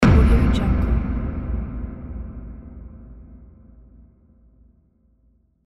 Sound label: trailer hit